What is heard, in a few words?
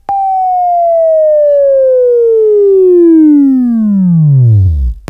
Buzz